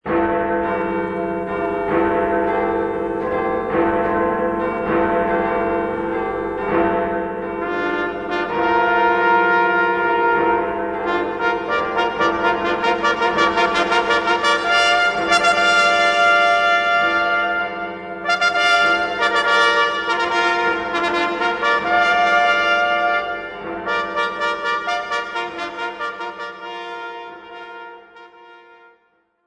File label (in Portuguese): fanfarra com sinos